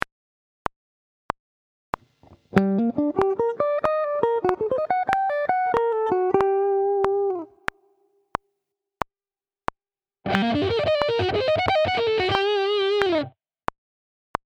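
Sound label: Sweep Picking, guitar; Sweep Picking Part 3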